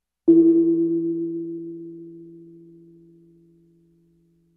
Drums Percussion Gongschlag Klein

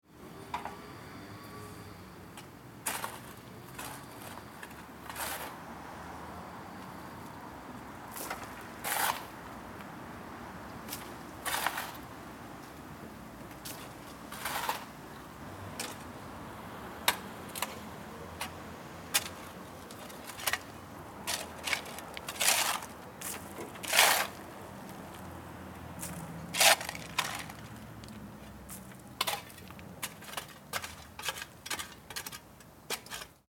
Schaufel

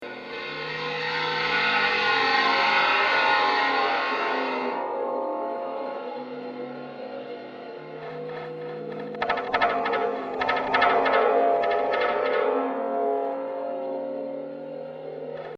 Vorhaengeschloss

